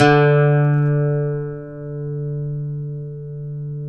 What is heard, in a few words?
OUD D; Guitars; Spectrasonics; Hans Zimmer Guitars VOL-2